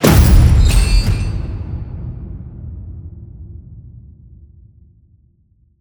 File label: HIT Metal Crash, Cinematic Hits Designed